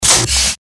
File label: high voltage